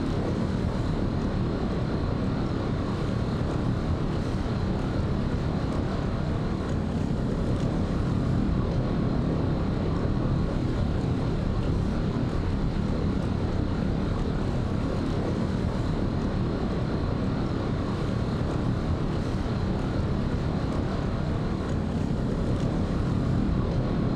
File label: ship destroyer idle; Stellaris; machineage; ships